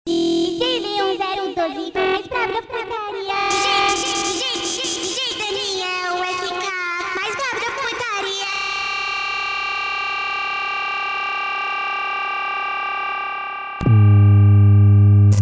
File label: funk Sped Up